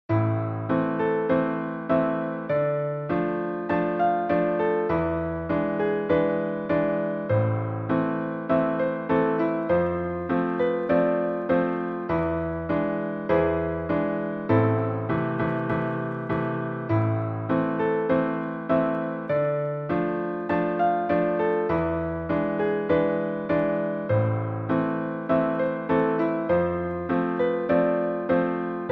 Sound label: tango noty fortepi